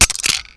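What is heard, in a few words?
stone; Mafie 1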